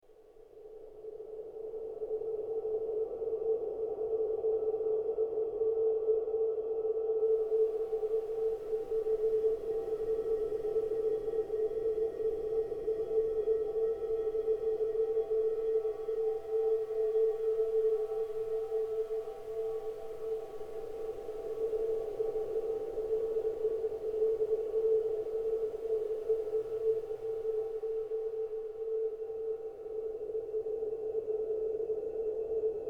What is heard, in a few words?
space sound hi